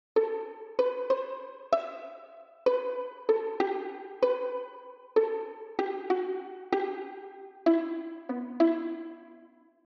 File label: VLN C1